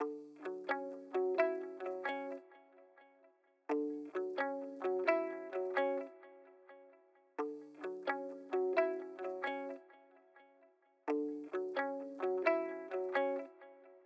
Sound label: Lead